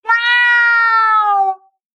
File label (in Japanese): neko